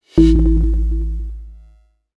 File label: pop up box